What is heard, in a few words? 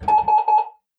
computer Beeping BIP BIP BIP